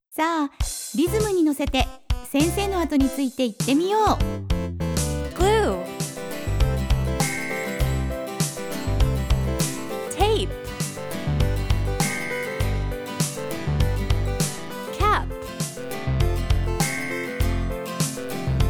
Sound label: 16 beat; kindergarten practice